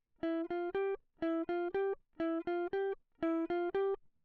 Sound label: electric